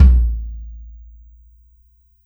Kick Shard, Processed Drumkits

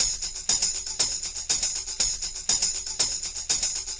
Percussion, R&B Drums

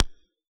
Pulse M; 155 DJX Kit

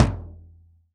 Tom Floor; Acoustic and Electric; Polyend; Simulacrum Sounds; 1 Shots

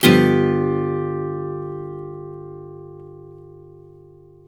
CHORDS, Blues Guitar; ILIO; Fretworks

